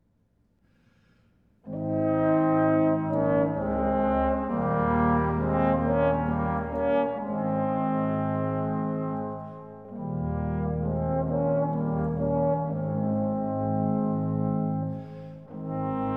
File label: Adagio (2 trombones, organ, violin); BAROQUE; Fux; Requiem, 'Missa Pro Defunctis'